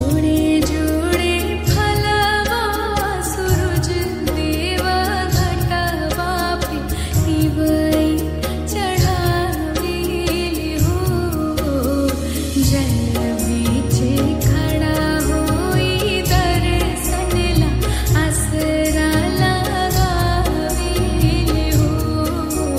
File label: Chhath Puja; bhojpuri